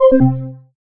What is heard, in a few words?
low battery; effects